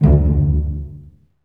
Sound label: STR SEC, STRINGS; Roland - LA Composer vol. 1